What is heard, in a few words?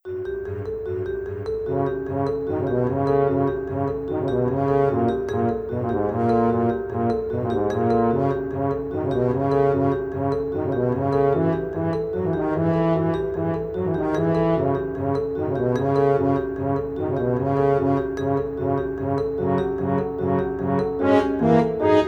battle; ceannduine